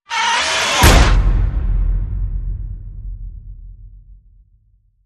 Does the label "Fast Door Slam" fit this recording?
Yes